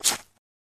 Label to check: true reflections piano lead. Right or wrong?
Wrong